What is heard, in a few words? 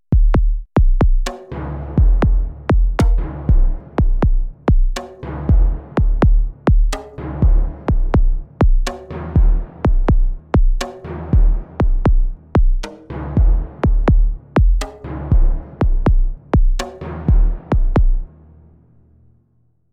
punch bd